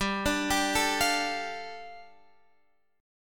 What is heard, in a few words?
G 7th Suspended 2nd G Guitar Half Step Down